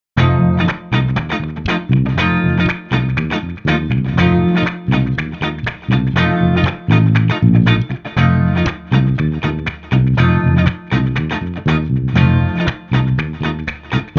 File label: Guitar Bass Cool Funk no Drums 120